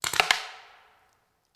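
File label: Paintball Gun Impact; Sound Ideas; Guns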